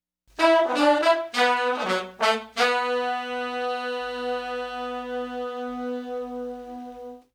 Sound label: Soul Horns, 70s Analog Funk